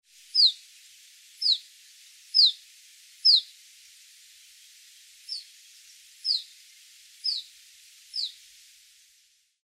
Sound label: iberian chiffchaff